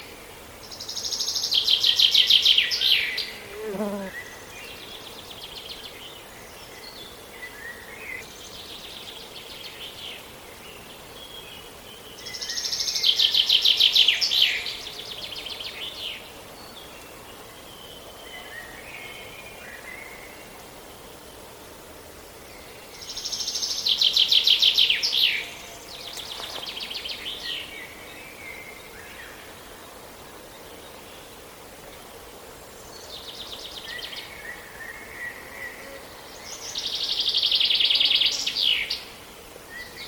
buchfink gesang; vogelstimmen; nabu; lars lachmann

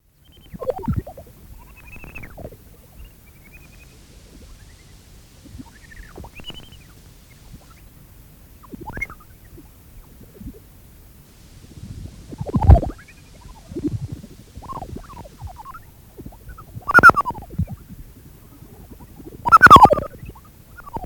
Kl Hufeisennase

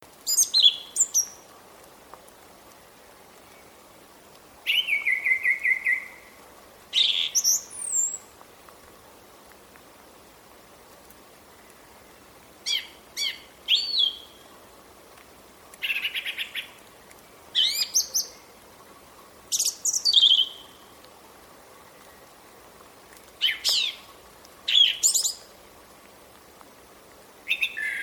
singdrossel gesang; vogelstimmen; nabu; lars lachmann

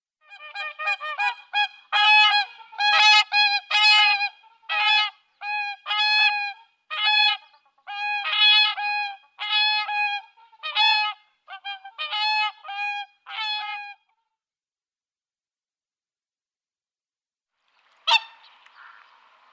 singschwan

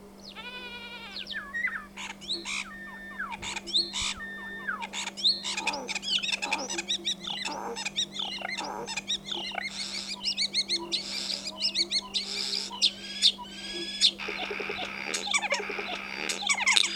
star, vogelstimmen; xenocanto

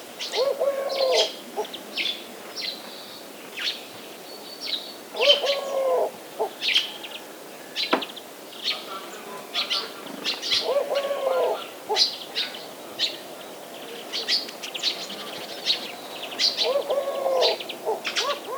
tuerkentaube gesang; vogelstimmen; nabu; lars lachmann

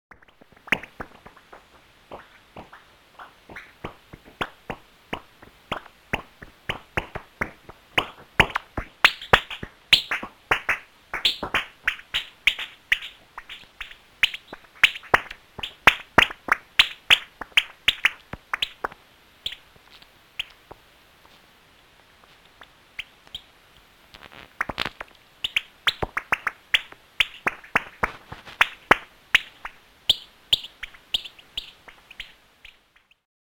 Gr Abendsegler

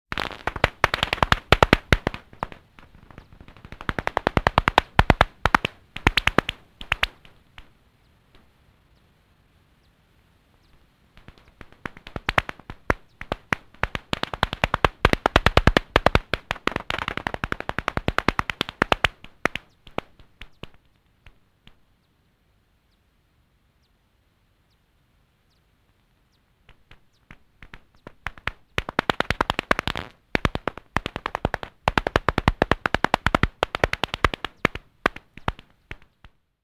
Teichfledermaus, Fledermausrufe

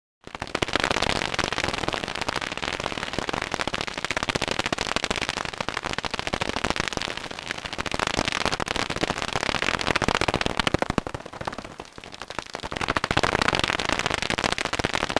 Zwergfledermaus, Fledermausrufe

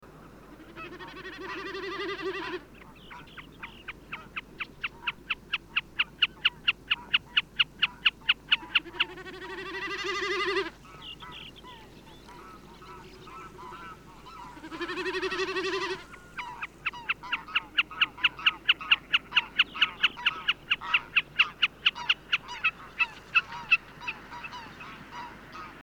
bekassine; vogel des jahres 2013; xenocanto; patrick aberg

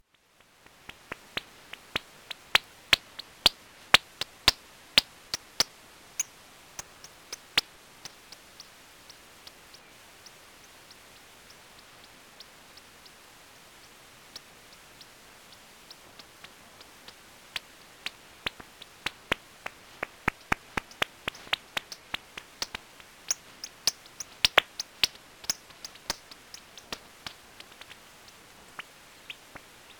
Kleinabendsegler

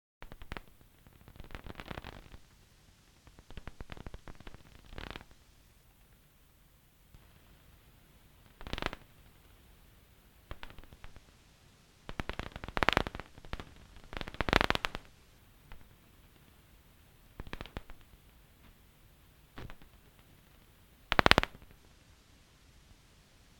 Bechsteinfledermaus, Fledermausrufe

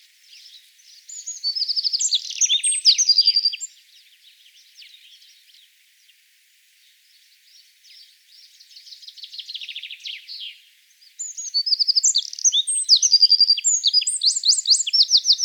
zaunkoenig gesang; vogelstimmen; nabu; lars lachmann

